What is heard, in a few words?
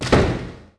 gate close